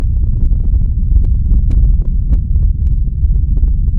distant fire roar

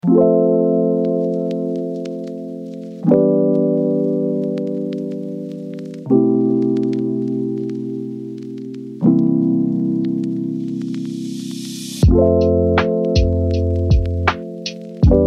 mellow rhodes study

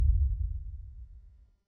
deep confirmation thud

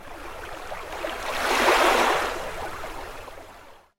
gentle ocean lapping

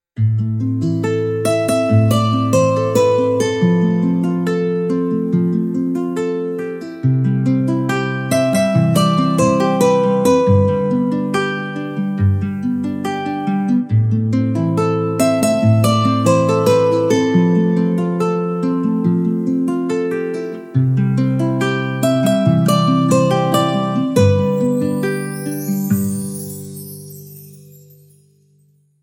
gentle folk melody

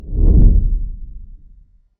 deep heavy whoosh

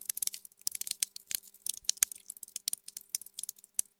campfire crackle

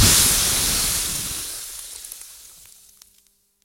log fire sizzle

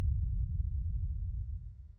distant low rumble

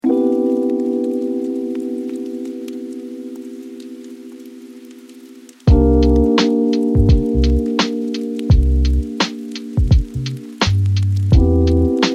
hazy reflections

